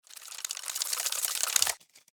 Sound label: deposit cash, atm